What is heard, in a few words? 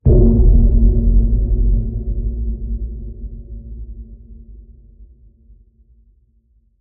Wraith Ping